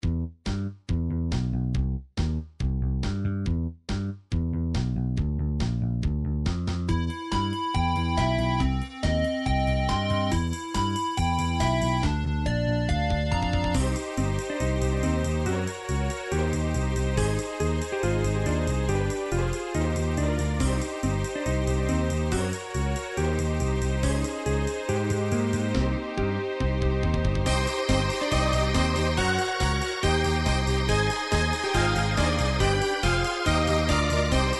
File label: techno remix; nintendo 64; sequenced; donkey kong 64; creepy castle